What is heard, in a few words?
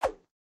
Swing Small